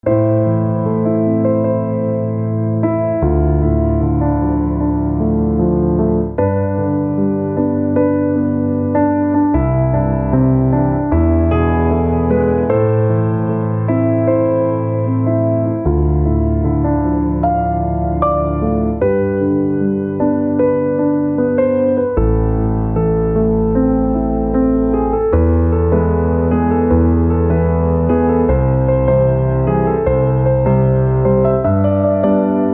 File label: Piano